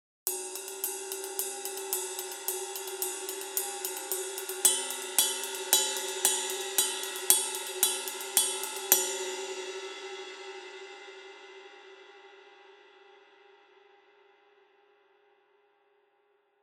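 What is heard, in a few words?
Resonant Ride 20 Rock Heavy, cymbal; Masterwork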